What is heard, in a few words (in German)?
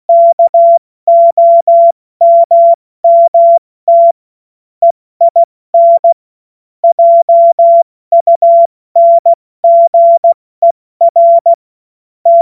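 Witz, Morsekurs, cw morsen; Witze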